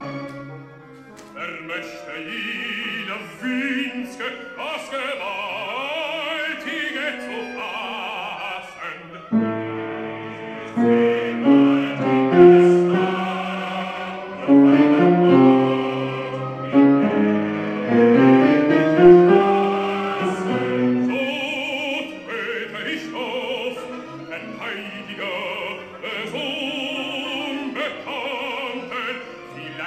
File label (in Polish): tenorzy i basy; cardillac; dyrygent - Joseph Keilberth